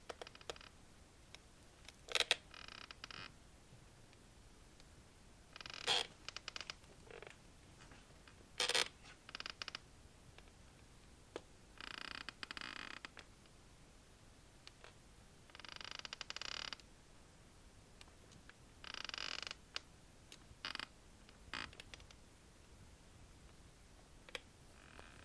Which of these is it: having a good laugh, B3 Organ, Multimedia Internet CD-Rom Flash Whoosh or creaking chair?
creaking chair